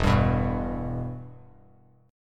E-Minor, Bass Standard; strummed; JGuitar

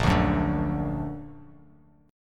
Bb Augmented Bb; strummed; JGuitar

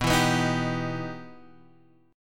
E Minor Major 7th B

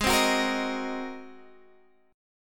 G sharp 9th, Guitar, Open G

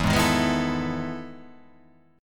E Major 11th E down; EM11 strummed; JGuitar

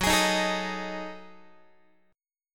Gb Minor 6th Add 9th Gb down Guitar; strummed; JGuitar

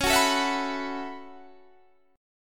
A 6th Add 9th C sharp, guitar

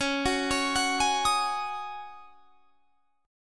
C sharp Suspended 2nd Suspended 4th; C#sus2sus4; arpeggiated; 21,21,23,23,21,23

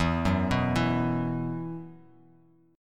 E Suspended 2nd E down, Esus2 chord; arpeggiated

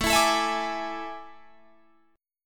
A Major 7th Suspended 4th, Guitar, Drop D

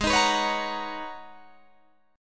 G sharp Minor 11th G sharp Guitar DADGAD, chord

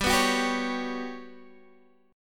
G Major 7th Flat 5th G, Guitar Standard; strummed

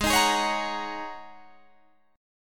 Gsharp 9th Suspended 4th Gsharp Guitar Standard; strummed; capo 9

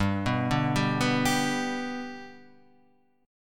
G Major G, chord

